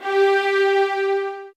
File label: SOFT VIOLINS